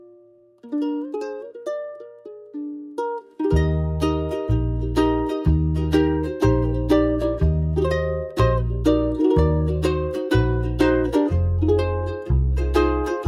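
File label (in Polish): Muzyka Hawajska; Mele Hawaii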